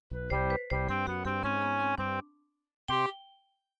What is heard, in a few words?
MIDI Animation stings